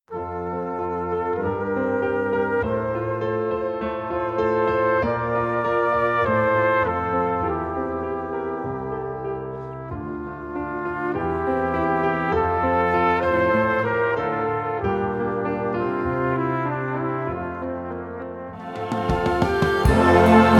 Jodel Chor, Klavier, Blasorchester, Kammerorchester, Alphörner in F

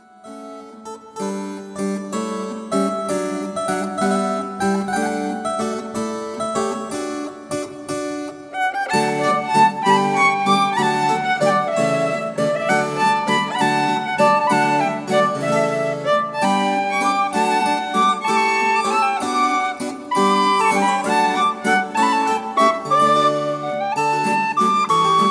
Violine, Tinwistle, Klavier

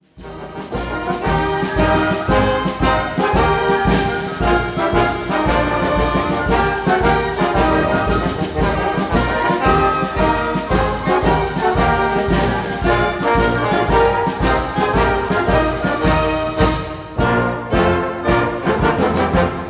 Blasorchester; Linder-Verlag

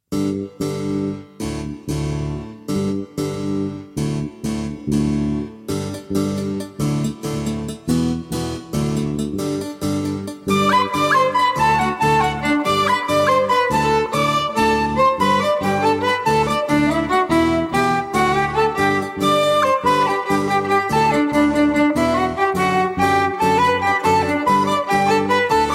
Tinwistle, Violine, Bassgitarre, Schellenkranz, Kavier

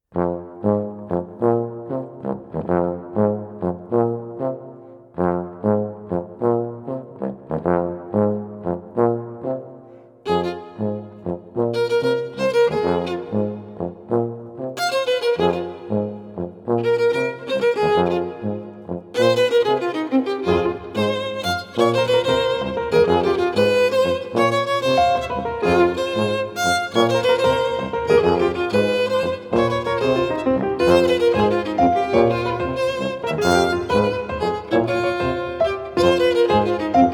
Violine, Tuba, Klavier; Linder-Verlag

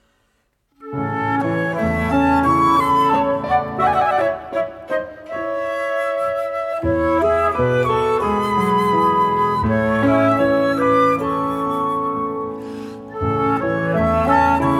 Querflöte, Klarinette und/oder Klavier; Linder-Verlag

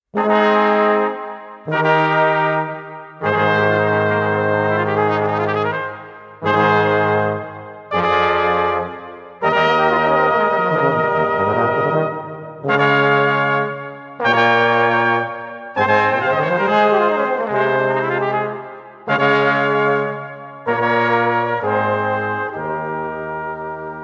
Brass-Quartett

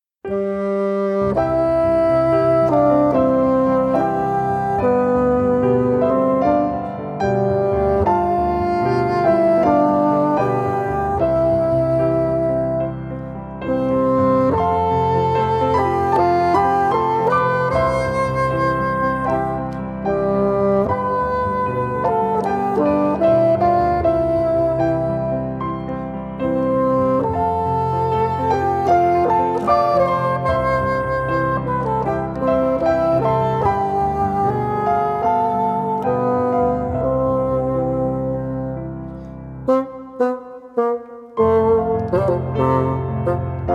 Fagott, Klavier; Linder-Verlag

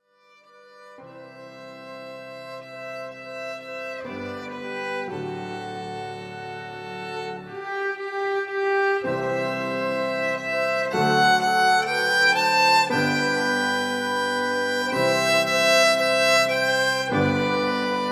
Violine, Panflöte, Flügelhorn, Stimme, Drum Set, Klavier; Linder-Verlag

